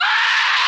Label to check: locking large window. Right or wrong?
Wrong